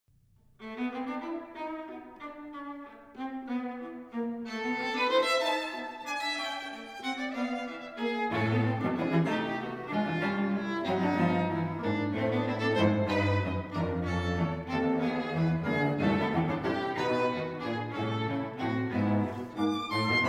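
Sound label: string trio; rontgen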